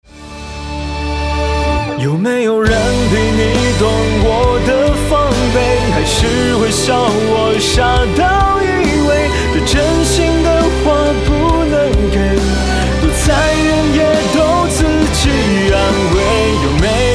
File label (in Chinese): 流行配唱